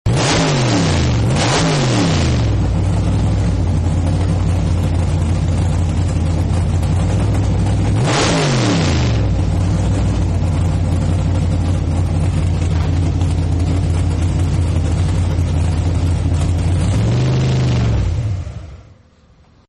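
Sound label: Hot Rod Rev Idle and Shutdown, Cars; Vehicles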